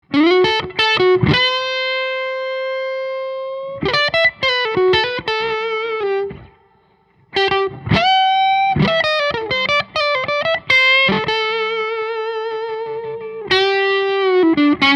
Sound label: Gibson Les Paul, solid body electric guitar; collectors choice limited edition